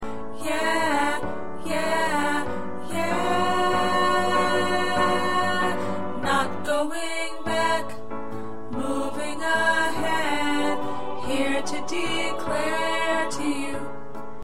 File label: Tenor, choir parts